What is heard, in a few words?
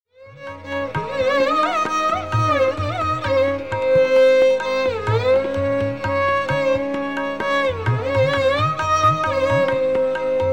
Bhajan Thumaki Chalat Ram Chandra Dadra Solo, tabla; N Rajam